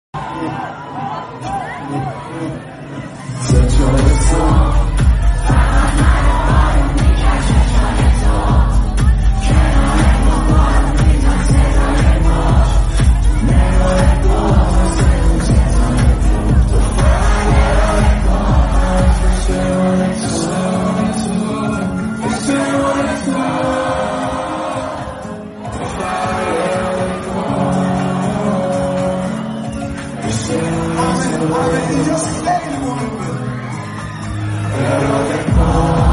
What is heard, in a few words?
Concert